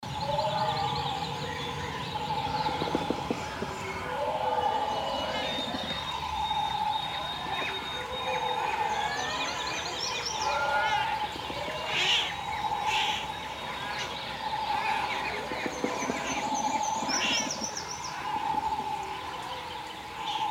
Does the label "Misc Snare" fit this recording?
No